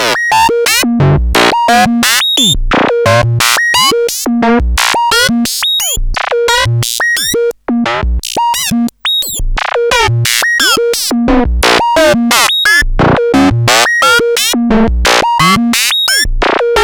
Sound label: SEQ MONOPL; AMG - Now CD-ROM (Roland); Old Gold; Old Gold SYN